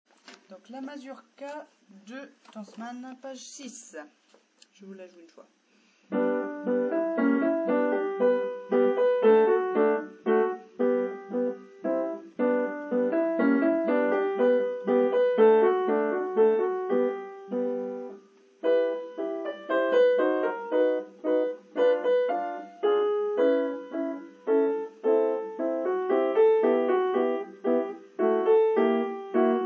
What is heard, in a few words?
mazurka tansman